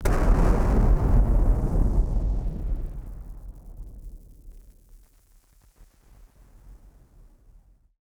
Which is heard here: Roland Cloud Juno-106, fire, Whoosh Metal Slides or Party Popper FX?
Party Popper FX